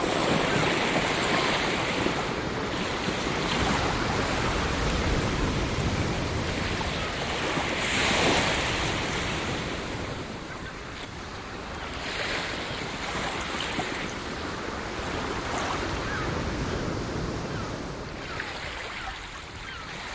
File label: ocean